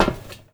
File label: Step Metal Iron